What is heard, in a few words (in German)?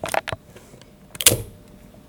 Geraeuschequiz - Tesa abrollen